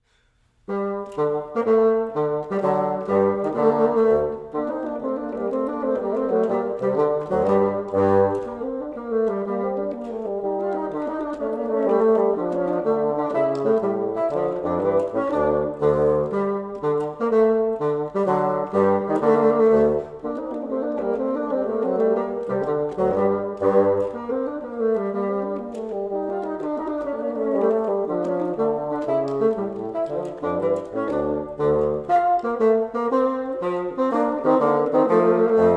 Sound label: gavotta